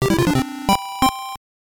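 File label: Jingle, Super Game Boy; Parasol Henbee Niji no Daibouken; GB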